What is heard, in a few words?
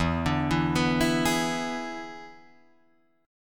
E 7th E down Guitar Drop D